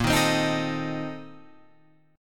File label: A sharp Major 7th Suspended 2nd Suspended 4th A sharp Guitar Open F; A#M7sus2sus4; strummed; JGuitar